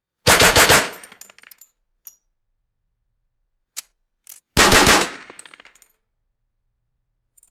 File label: short assault rifle burst indoors with falling casings